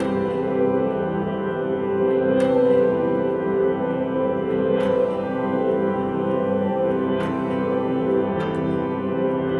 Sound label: C Piano Drone; Ambient Textures; Instrument Drones